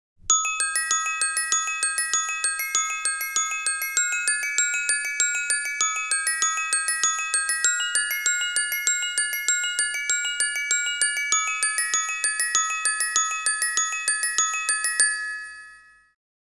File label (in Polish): dzwonki